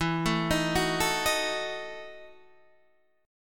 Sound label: E Major 7th Suspended 2nd Suspended 4th E, Guitar, Open D; chord shape